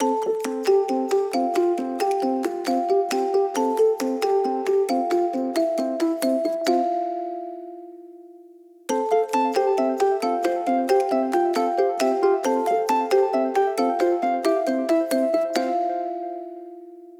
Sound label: system ringtones; Amazon Fire-Phone